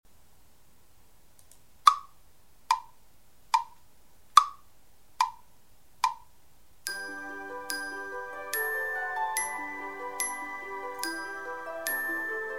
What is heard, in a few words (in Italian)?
Dolce melodia